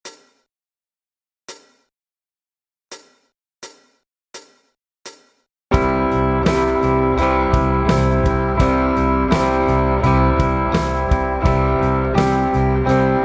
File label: Jingle Bells Christmas slow